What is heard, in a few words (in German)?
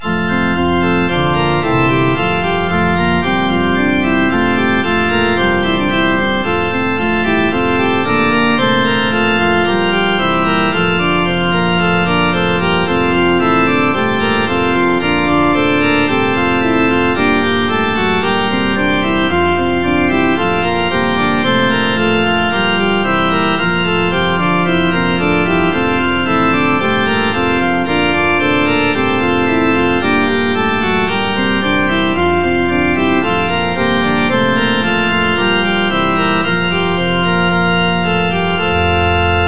nun danket all und bringet ehr, orgel